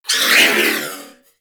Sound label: Missile Tail; Warframe; Weapons; Archwing